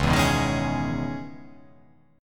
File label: C sharp Minor 9th C sharp, chord